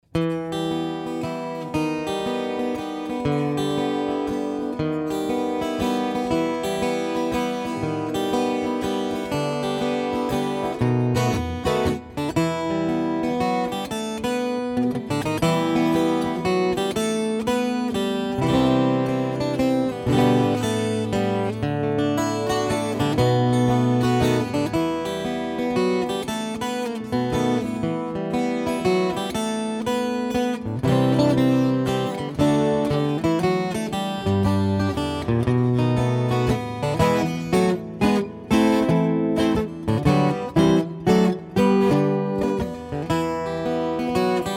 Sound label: Waltz